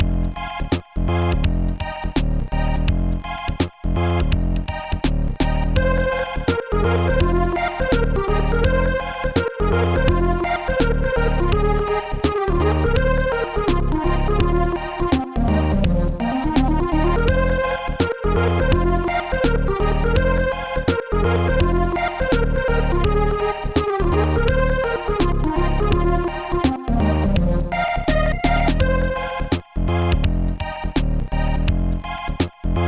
chiptune

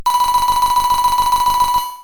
sfx; blinkys scary school; Sound Images; Tony Williams

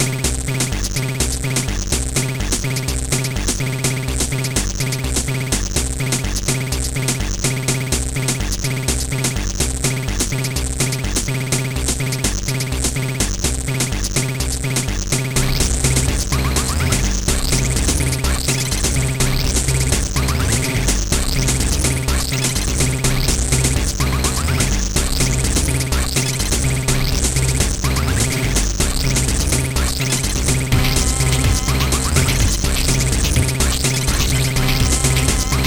non snarain sift, chiptune

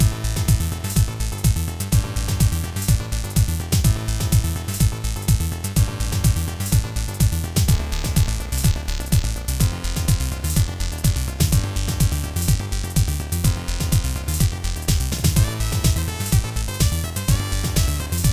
engine noise

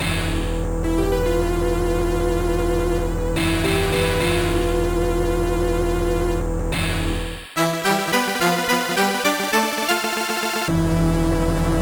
jingle; Space Gun

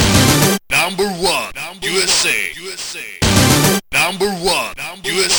beatbox; BLAIZER

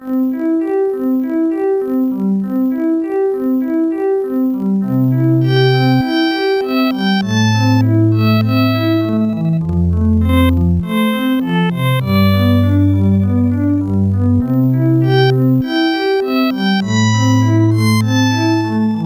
folk song; The Music Studio

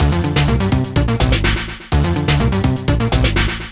beatbox; Pinball Dreams

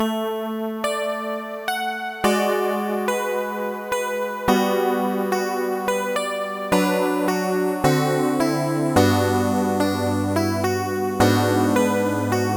el piano groove; Trackers; Modland

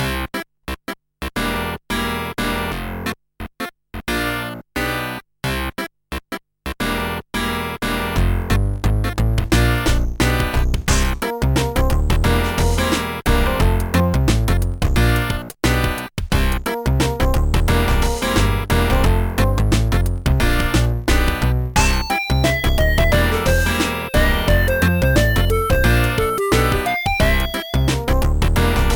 guitar fever; Digital Mugician

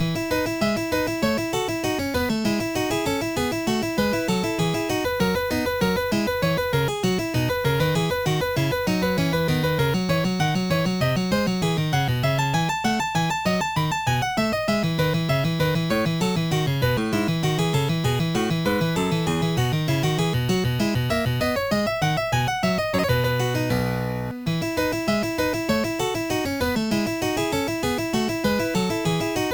prelude; OctaMED MED

